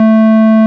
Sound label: ORG Farfisa Clarinet A 5